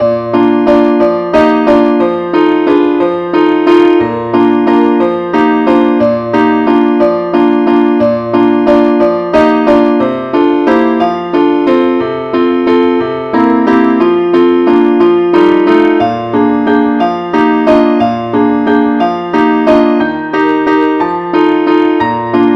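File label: advance accompaniment key b flat; Angel voices, ever singing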